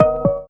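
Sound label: harmonica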